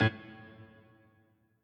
piano riff intro

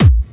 normal hit whistle

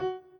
chord

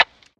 clock

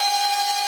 synth main

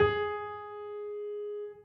soft hitwhistle

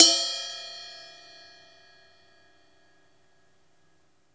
cymbal tap

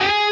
Bend full

